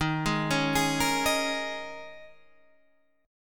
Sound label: D sharp 7th Suspended 4th, Guitar, Standard; D#7sus4 arpeggiated; JGuitar